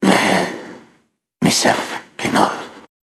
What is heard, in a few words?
jingle